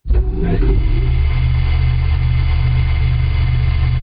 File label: Motor